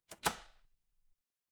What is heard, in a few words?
door lock